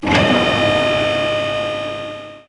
Elevator

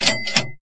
equip

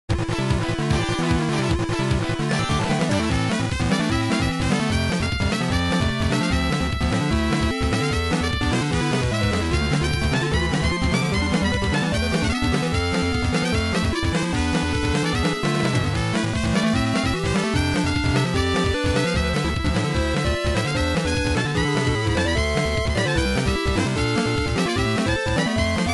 Battle; Magic Tower Night Crafting Story

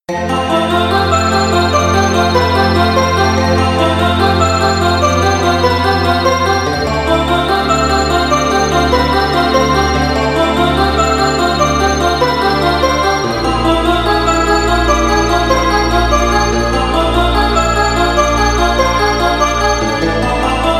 bgm

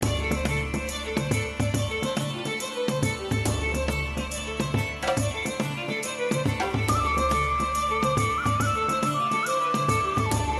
witch

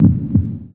beat; CatLegend2

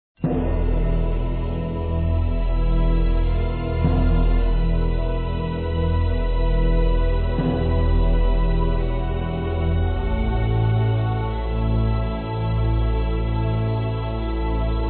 bgm

